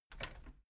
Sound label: metal button radio push power on